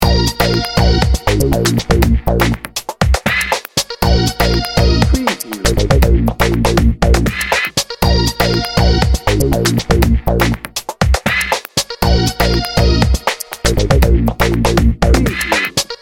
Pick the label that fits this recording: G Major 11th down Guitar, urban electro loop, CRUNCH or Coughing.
urban electro loop